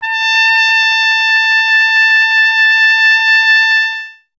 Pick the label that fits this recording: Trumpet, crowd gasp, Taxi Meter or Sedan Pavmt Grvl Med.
Trumpet